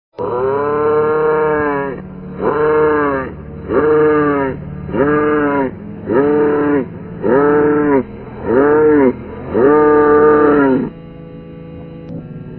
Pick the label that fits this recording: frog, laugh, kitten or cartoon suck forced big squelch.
laugh